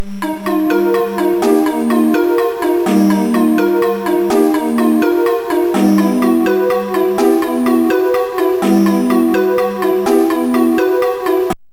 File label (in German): dur moll; MusicLine